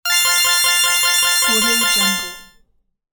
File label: bonus; astralaudio